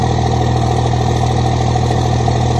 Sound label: motor scania 550 R